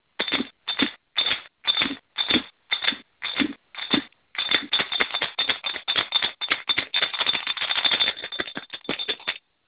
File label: rattle sound